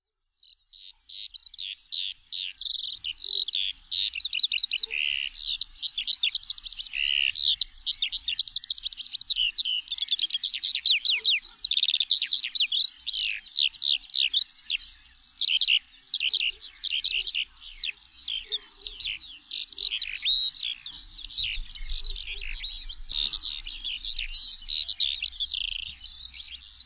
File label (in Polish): skowronek; birdsounds